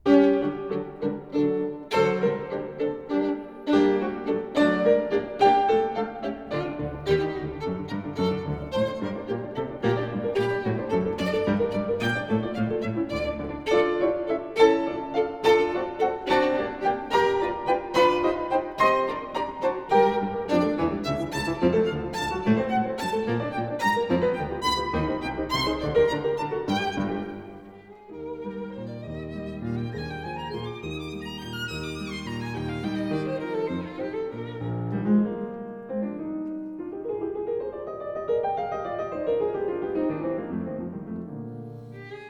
Violin Sonata No. 10 in G major, Scherzo. Allegro; Beethoven; Violin Sonatas; Gidon Kremer & Martha Argerich; Ludwig van Beethoven; Op. 96

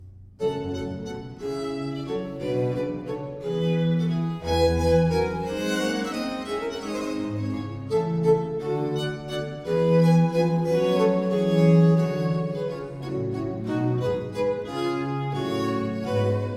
Sonata in G minor - Presto; 헨리 퍼셀; 4성부를 위한 10개의 소나타

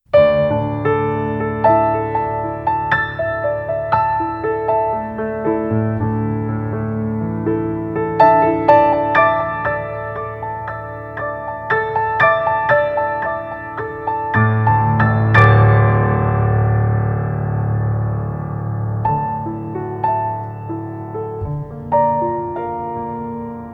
Jazz Trio

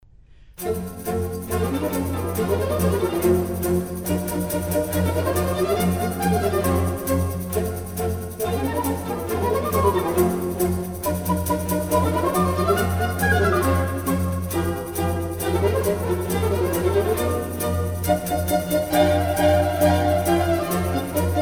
Tambourins I et II, French baroque arias; Rameau; Les fêtes d'Hébé; Première Entrée La Poésie

